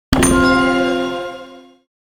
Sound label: Game bonus reward